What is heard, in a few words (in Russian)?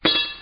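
упал металлический предмет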